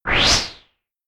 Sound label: notification; samsung Aleart